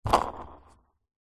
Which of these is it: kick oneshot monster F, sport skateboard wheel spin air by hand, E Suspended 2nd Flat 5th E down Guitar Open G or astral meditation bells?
sport skateboard wheel spin air by hand